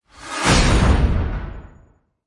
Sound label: Flew Hit Swell; Ninja Tracks